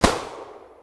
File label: shoot; target hunt